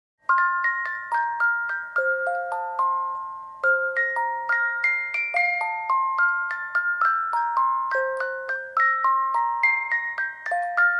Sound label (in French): berceuse; lutece; de Mozart